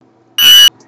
horrible high pitched sound

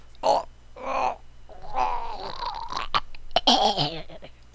choking

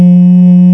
beep

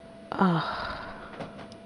ugh

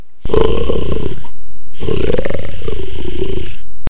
roar; rahi; muaka; bionicle next gen

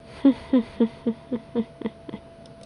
chuckle; Nokama

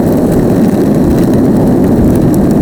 roll asphalt

